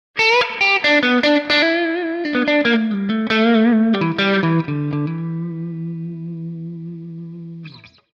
Blues Guitar - Short Solo; Hollywood Edge; sound designer's toolkit v2

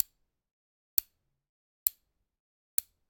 Generic Button Metal Tiny; boom library; The Interface

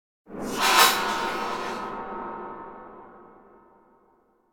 Whoosh Sharp Slicing Metallic - Dissonant Thin; Hollywood Edge; sound designer's toolkit v2

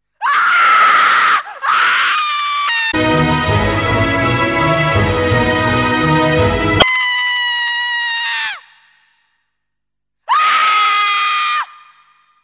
Woman Screams; 1001 Sound Effects; Halloween Scary Music and Sound Effects

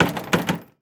Hospital Door Close; Medical; Blastwave

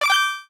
coin; Blind Drive

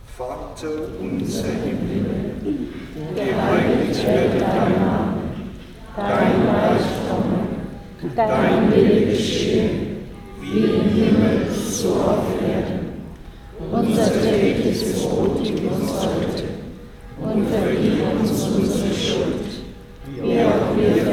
church vater unser; best service Blue Box

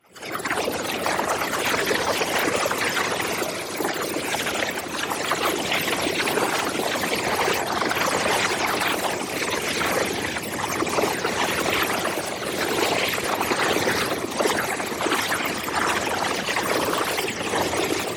PARTICLES Charged Storm; boom library; Matter

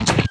Humanoid Ranged Physical; Kilta

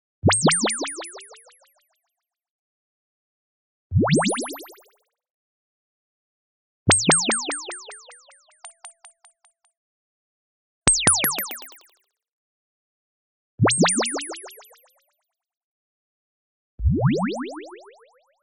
LASER Sweep Bubble Up Large; Anime Essentials

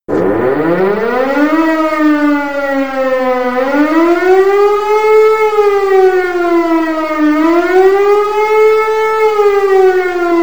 alarm; Apotheorasis

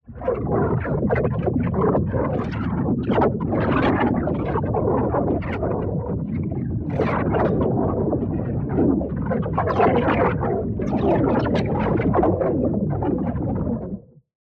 TEXTURE Fluctuating Field; boom library; Matter

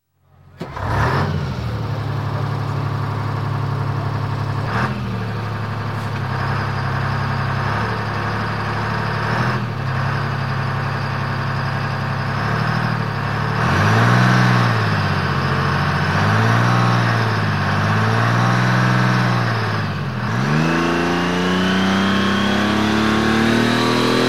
Military Tank; Sound Ideas 2000 Series

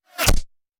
Bullet Impact; Sound Ideas; Guns

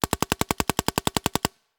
Paintball Gun; Sound Ideas; Guns

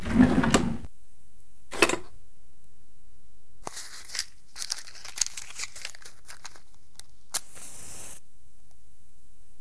match sequence; blindside

